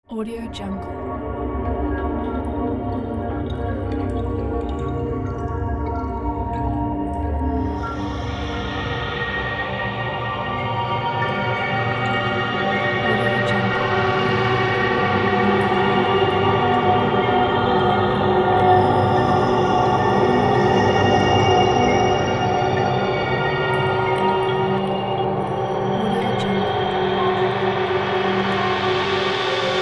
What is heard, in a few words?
dark space atmosphere